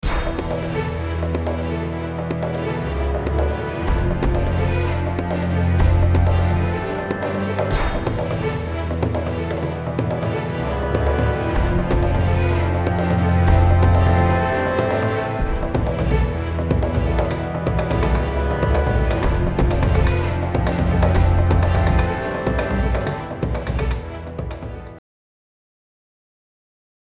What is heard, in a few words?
score before tube; VTC TUBE BOOSTER; TUBE INSTANCE